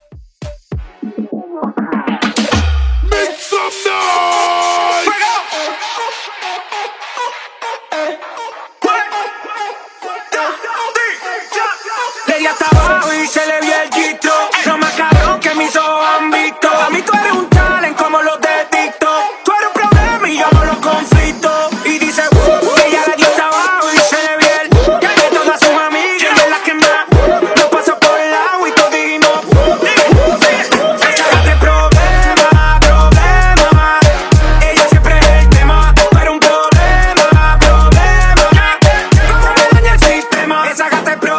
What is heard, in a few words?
Moombahtom Break Intro, 100 BPM